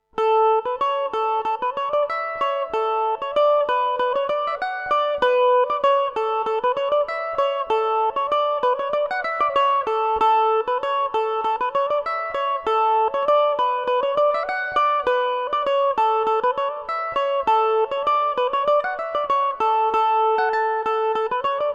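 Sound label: A major revelation, irish mandolin; The Tangled Web; polka in A Major; Revelation Jaguar electric mandolin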